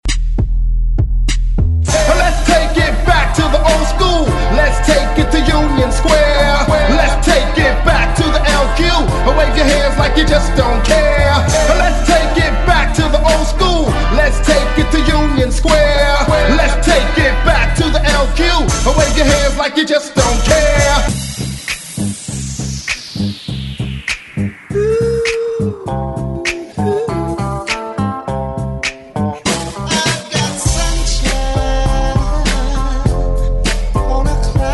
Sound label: 60s Hype, 100Bpm; DJDOLLS; ReDrum